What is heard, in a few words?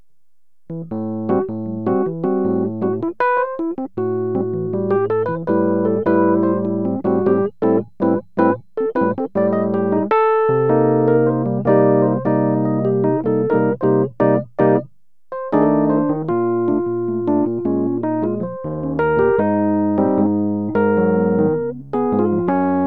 Parallel No Tine Bomb